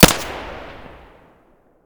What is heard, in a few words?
pm muzzle stereo, weapons